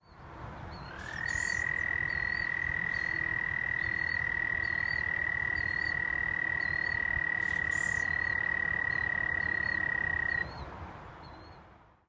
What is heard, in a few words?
frog chirping